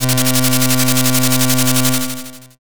slot spin

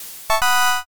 challenger

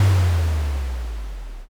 earth magic

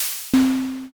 petrify

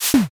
spear

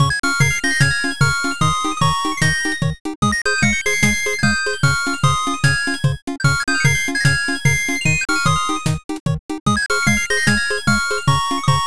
bgm bright

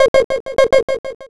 frog

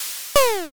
sneeze

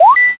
unlock

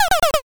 laugh single

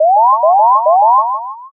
scan

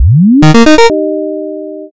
boot

